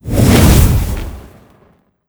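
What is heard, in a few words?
incubator spawn, monster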